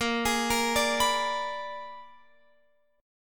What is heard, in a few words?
A sharp 7th Flat 9th A sharp down Guitar Open F; A#7b9; arpeggiated